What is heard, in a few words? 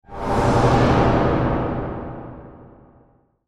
Whoosh Reverb; Blastwave; Sound Design